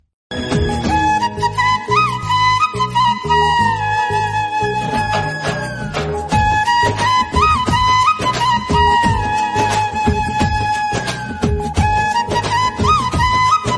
Kannada Best Love Bgm

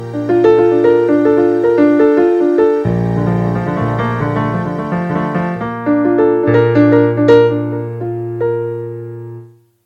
easy piano ringtone

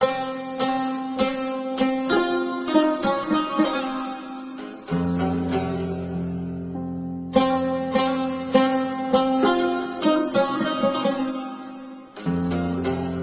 Arabic Clasic Ringtone

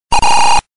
stopping; sonic

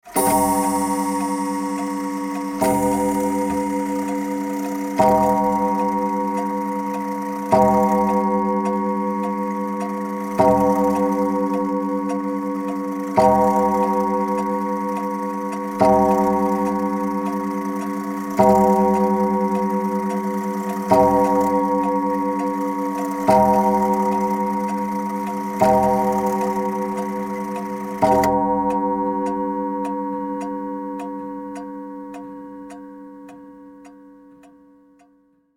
clock chime sound

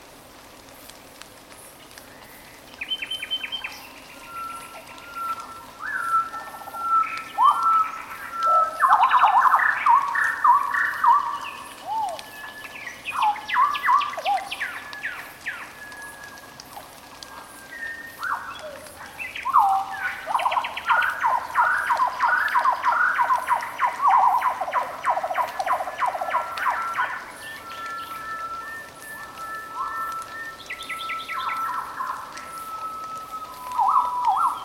night forest

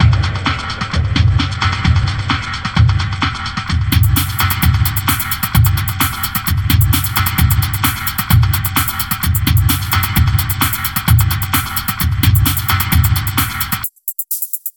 weird music ringtone

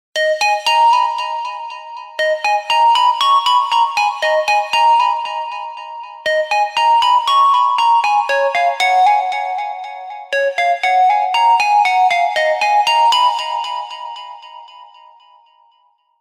emotional music ringtone

